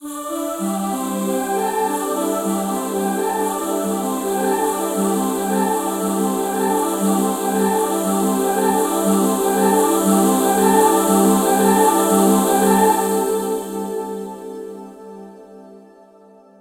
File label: The Melody